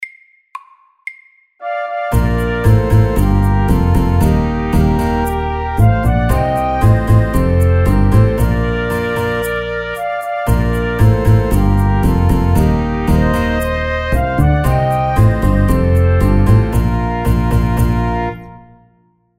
Mariachi band MIDDELS

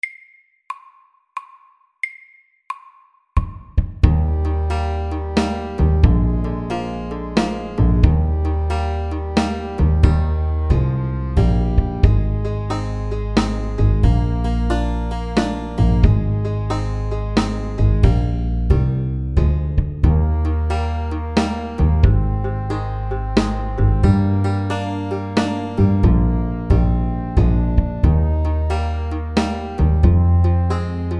Country shuffle SLOW 90

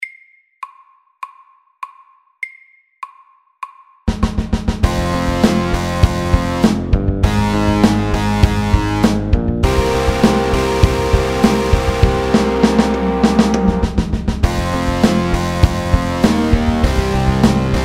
Pirate rock FAST 100

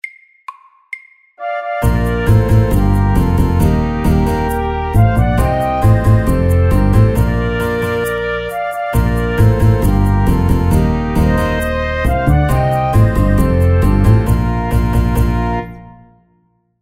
Mariachi band FORT

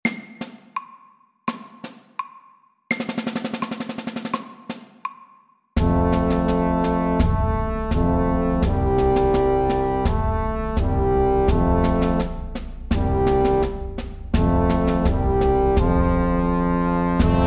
SLOW; Kryssmarsj

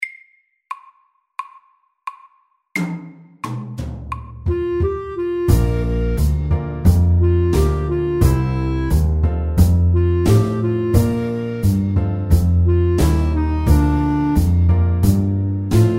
Slow blues fort

